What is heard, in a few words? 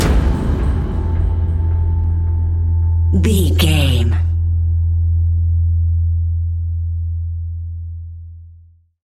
horror haunting whispers stinger